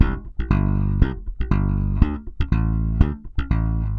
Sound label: SM BS C, BASS SAMBA